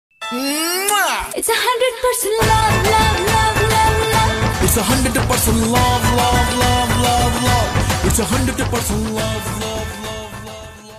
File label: love bangla